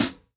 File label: Chrome Hihat, Closed; Lofi; Cymatics; Lofi Starter Pack; Hihats